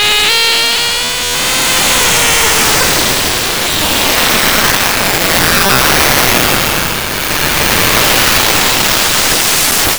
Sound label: NOISE VARI; Big Beat Samples & More; AKAI